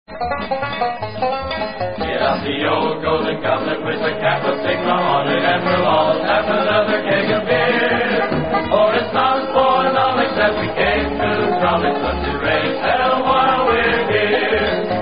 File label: fraternity song; The Silver Goblet Golden Goblet Fraternity Song